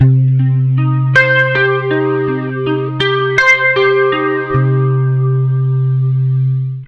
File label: 80 MIN ARP C, Acoustic Guitar Loops; USB Soundscan vol.04 - Electric & Acoustic Guitar Loops; AKAI